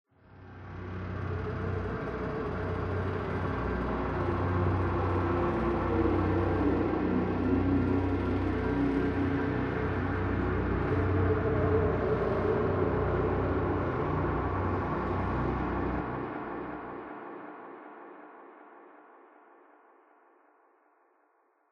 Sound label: Npc Combat Dark Pad